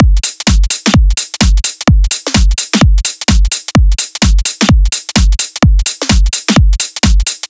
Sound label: Full Drum Loop - 128 BPM, House; Drums; Loops; Cymatics; House Starter Pack